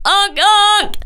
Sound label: honk honk